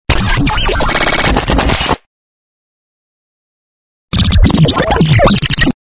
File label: Galery Noise; Sound Ideas - Galery Noise 001